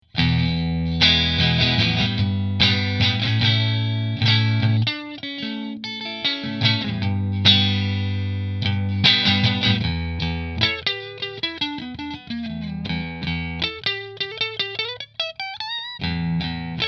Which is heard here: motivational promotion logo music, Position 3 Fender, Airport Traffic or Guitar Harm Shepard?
Position 3 Fender